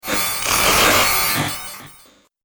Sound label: Droid Data Motion, robot; Robot Sound Effects